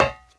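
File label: calm quiet knock with knuckle on metal tank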